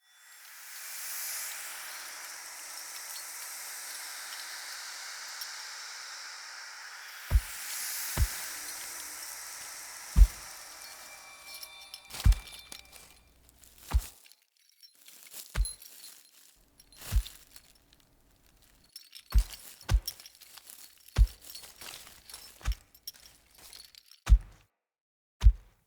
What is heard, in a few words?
Tree Growth Foley; Bromius; Cinematics